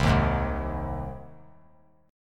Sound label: C Minor Sharp 5th C 3C,3F,3A,3D; strummed; JGuitar